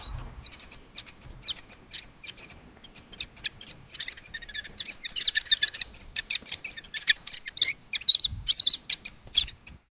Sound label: pet rat squeaking